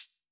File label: OH Combo 808, Open HH; Clean; Digital